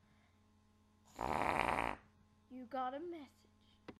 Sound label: fart